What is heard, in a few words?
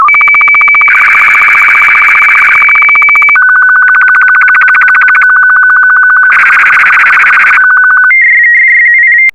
sstv; R8BW